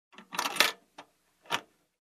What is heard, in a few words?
Construction